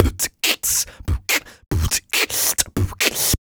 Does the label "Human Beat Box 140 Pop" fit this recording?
Yes